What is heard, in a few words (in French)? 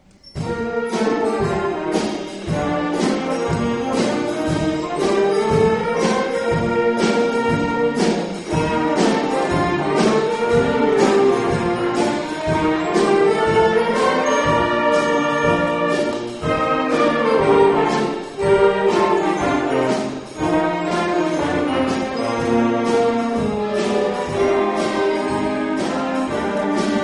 Gamme